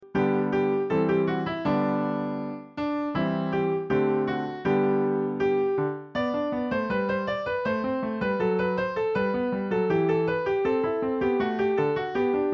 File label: instr; Ding Dong Merrily on High